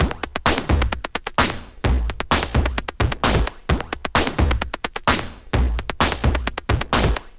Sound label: 130 Pop Blip Beat, Pop Rock, Drums, Very Fast Tempo; Pro Tools Essential Loops; Digidesign